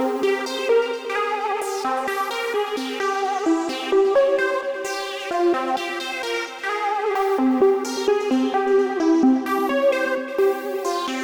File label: melodic enchantment